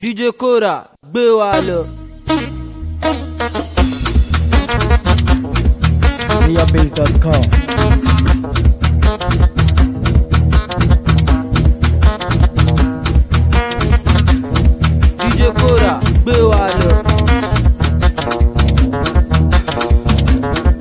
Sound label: Hausa Mara Beat